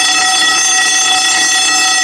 alarm

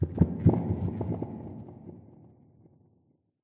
amb abstract bubble impact, ambient

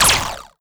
bolt huge zap

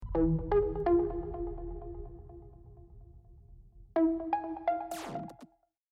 deathcam

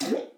paint trickle; Portal 2

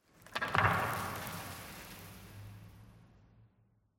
tenement concrete debris; ambient, rocks

